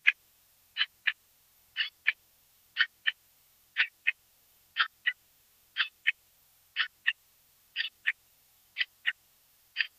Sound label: clock ticking slower and; timekeeping